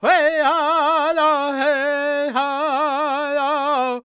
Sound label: C# MIN, World Voices, LAKOTA